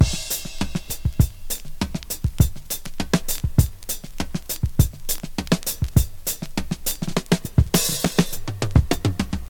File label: 101 bpm breakbeat sample g sharp key, Free drum groove - kick tuned to the G# note